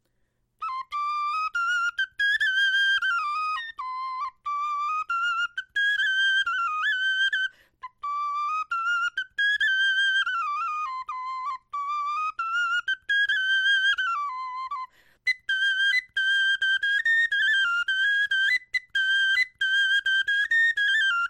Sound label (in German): melodie